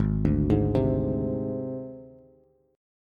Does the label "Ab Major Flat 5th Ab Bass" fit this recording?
Yes